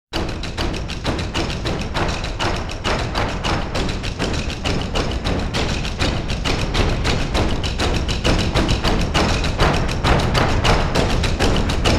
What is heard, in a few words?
Sequential Prophet X Taikolicious